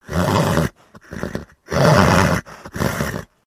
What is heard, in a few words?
Horse Vocal Short Ef, milling vocals